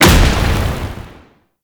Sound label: Hazard Spark Projectile Impact; Gameplay; Stadium; Warframe; Nineteen Ninety Nine